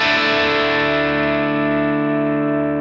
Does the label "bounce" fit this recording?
No